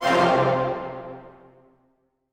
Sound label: Music Generic Marker Brass